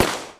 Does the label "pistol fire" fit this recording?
Yes